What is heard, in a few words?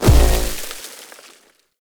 Hoverboard Land Snow A; Vehicles; Warframe; Venus Hoverboard